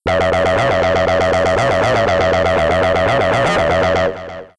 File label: Synth Mono